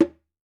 WALK DRUM, African Drums

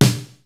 KICK SNARE; Kid Nepro; Elite; KICKS SNARES

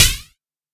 Quasimidi 309, Drums

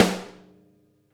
SNAR, Interactive Drum Kits; Sonic Reality; ID SNARE 1+

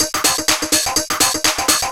125 BPM, Drum Loops; Zero-G; Club Techno; Drum Set

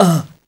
"AHH", VOCAL

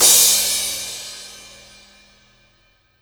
Crash Long, Hip Hop; Akai MPC500

